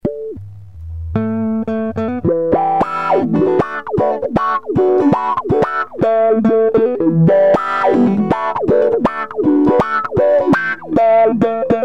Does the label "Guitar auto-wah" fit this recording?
Yes